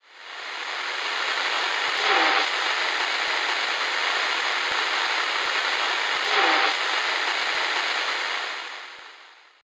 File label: radio noize; STALZONE